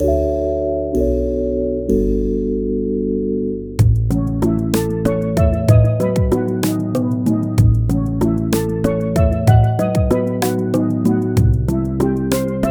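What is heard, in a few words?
background music map; ovenbreak 5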